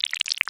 slime; kenney; sci-fi sounds

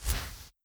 Tail Whip; yo frankie

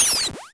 door Open, sci-fi sounds; kenney

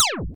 laser small; kenney; sci-fi sounds

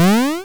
longspring

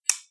switch; kenney; uiaudio

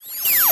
door Close, sci-fi sounds; kenney

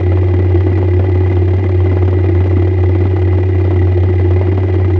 space engine small; kenney; sci-fi sounds

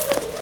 sfx jump

